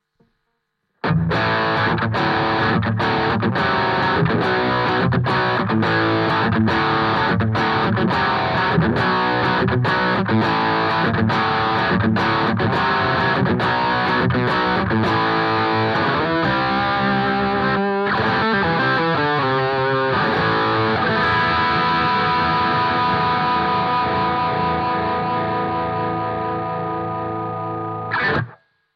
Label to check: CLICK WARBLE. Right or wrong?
Wrong